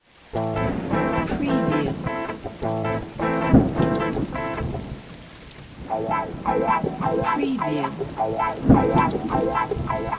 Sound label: RTM Bastaki Am 105BPM, electric guitar; 6-8